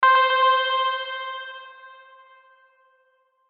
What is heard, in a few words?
Keys One Shot